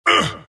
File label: wraith pain; dota; skeleton king